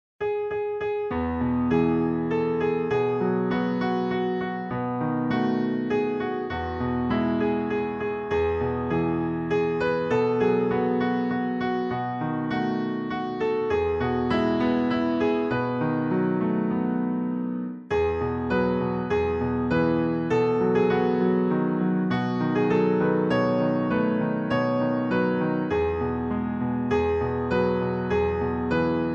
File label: il etait un petit navire instr; French